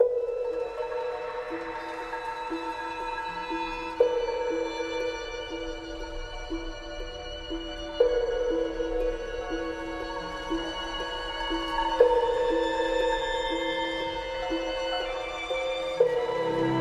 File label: Sorry Ambient